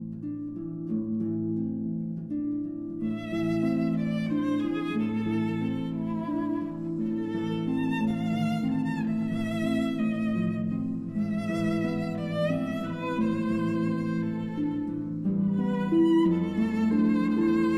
Berceuse, for violin and harp

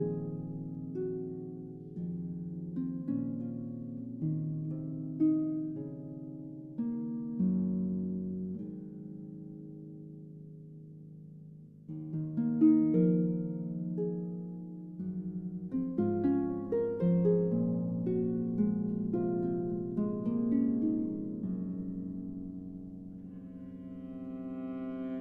Harp Concerto Lullaby

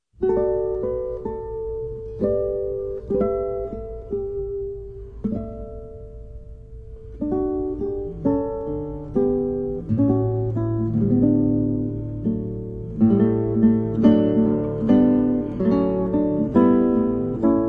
guitar, Polish Carol; Infant Holy, Infant Lowly

